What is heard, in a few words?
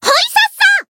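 Voice attack, Japanese; myungwha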